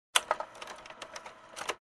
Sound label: Camera Projector Navigation Sequence